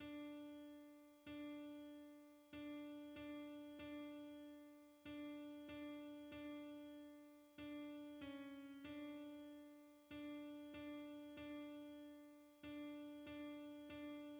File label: alto; hymns; How good is the God we adore